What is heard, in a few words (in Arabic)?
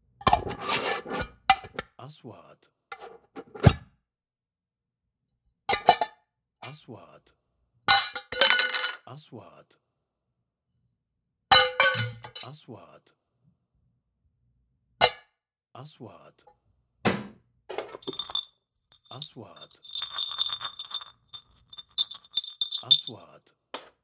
قطع معدنية